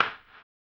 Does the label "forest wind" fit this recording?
No